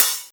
Open Hihat; Cymbals; Mutekki Media; Vengeance Electroshock Vol.2

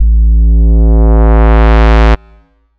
Synth Shot A; Mutekki Media; Vengeance Electroshock Vol.2

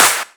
Claps & Snares

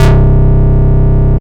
Bass Shot C

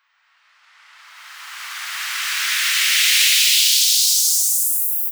FX Uplifter

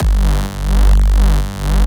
Bass Shot G

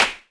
Clap, Dubstep One-Shot Drums

